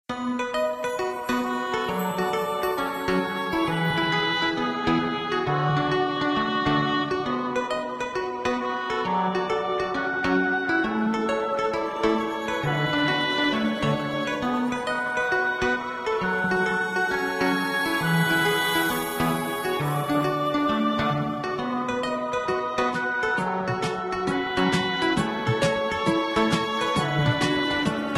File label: Comic Ambient; An Alien with a Magnet